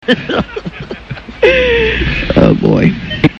laugh

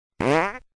fart

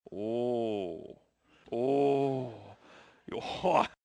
ooh

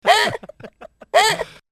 Laugh

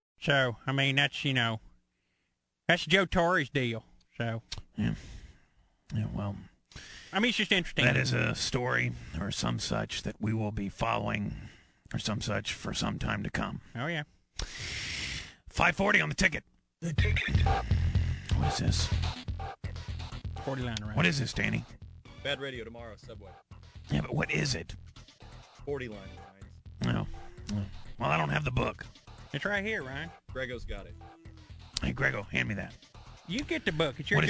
fart fall

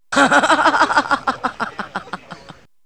chick laugh

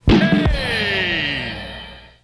rimshot

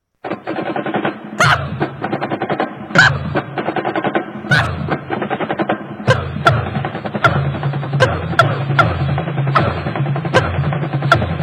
laugh song

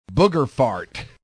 booger fart

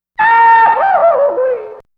goofy fall & scream

